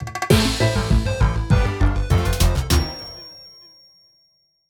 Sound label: course fail; Apple Cider Juiced!